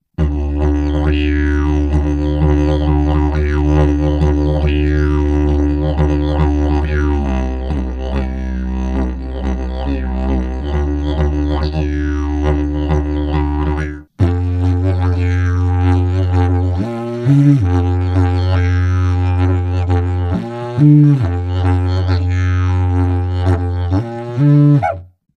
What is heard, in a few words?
didge